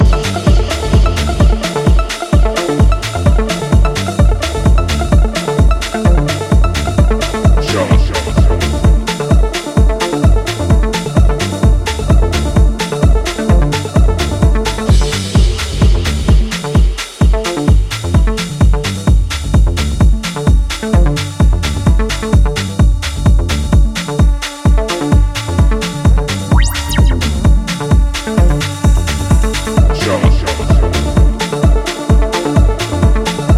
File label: Organ Matrix